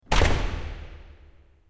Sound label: worker in; New Yankee in King Arthurs Court